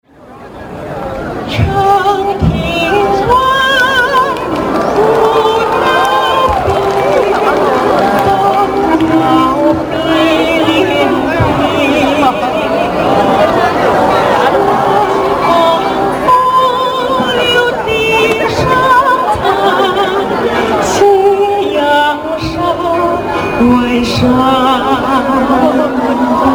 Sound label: Thailand Song